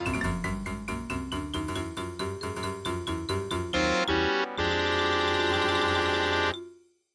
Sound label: MIDI Animation stings